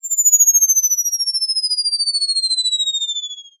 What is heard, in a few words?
cartoon falling flutter whistle